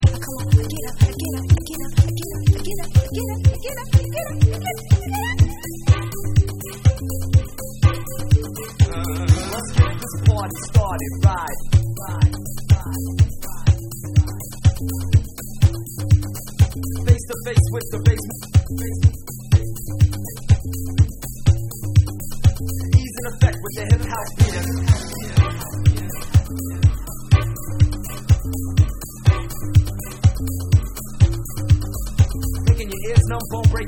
Instrumental Ska Dub

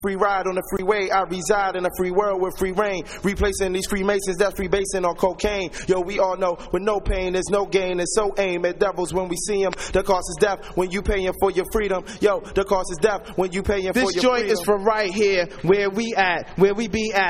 Acapella

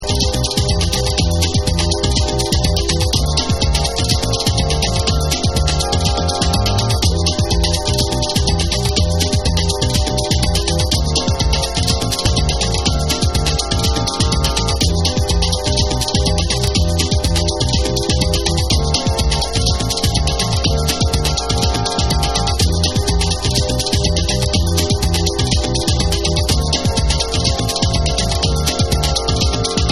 BRAZILIAN GROOVE Dub

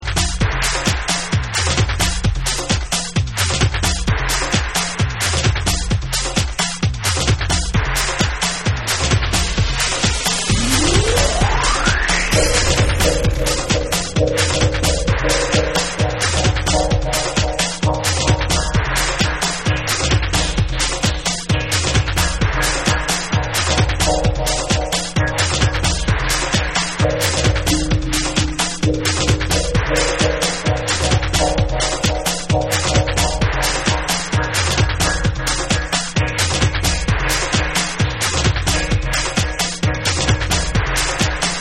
Tribal Dub

